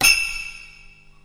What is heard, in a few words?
hitting tambourine; TJ Metronome